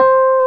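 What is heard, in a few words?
PNO, Electrics, Rhodes; Club-50 - Foundations Roland; Rds & Wurly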